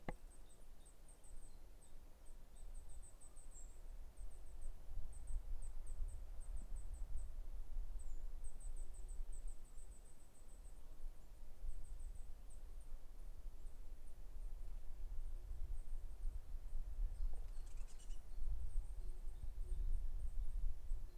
Bird

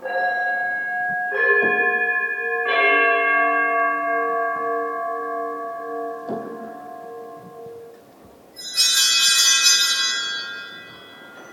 Church Mess Gong And Bells

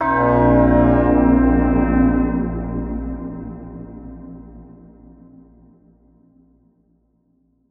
Alien Horn; Cuboos

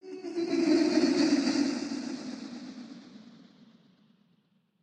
Spooky Laughs

